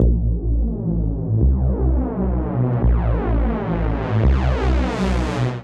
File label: Hoover Down A Minor 170 bpm, bassline; MIDI Clips; Tonal; Skitter and Step; Ableton Factory Packs